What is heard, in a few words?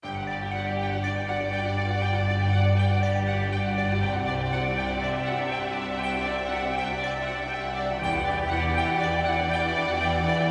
Thriving Ambient